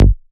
Ashes Bass G#

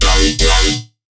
Riddim Bass Hit F; One-Shots; Bass Hits; Insekt; Ghosthack; Riddim Essentials Volume 2

